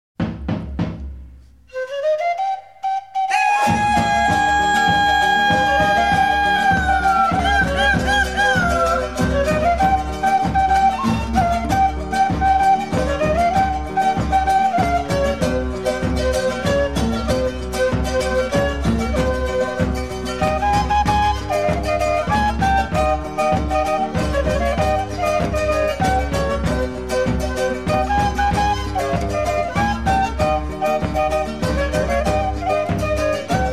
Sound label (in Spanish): risas de bolivia